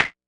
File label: Snare, drums; Ableton Live 12 Lite Core Library